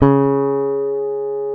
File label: P BASS SUSTN, C#3; Sampleheads; Will Lee Bass Library